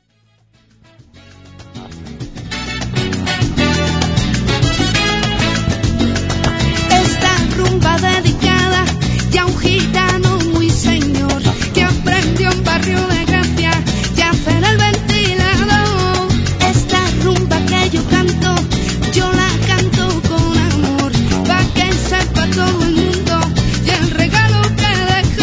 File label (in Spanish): rumba, al son del tambor; Baile popular de origen español